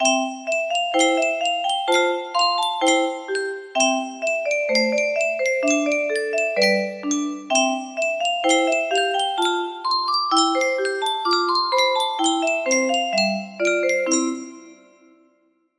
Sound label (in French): sankyo boite a musique, music box melody